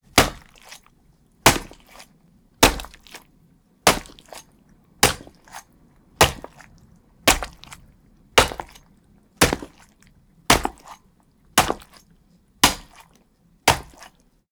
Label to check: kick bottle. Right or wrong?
Wrong